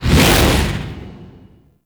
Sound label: Heavy Hammer Whoosh